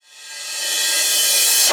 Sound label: Reverse Cymbal; Vengeance Samples; VEH1 Cymbals